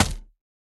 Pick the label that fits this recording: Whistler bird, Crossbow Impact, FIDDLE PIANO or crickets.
Crossbow Impact